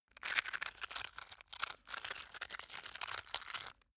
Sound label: stone scrape long; natur-tiere; steine